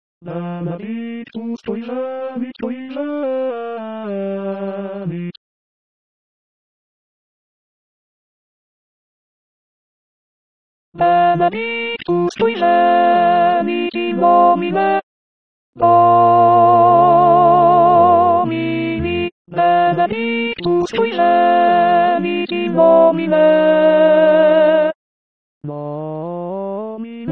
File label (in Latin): Benedictus Missa pro pace ALTI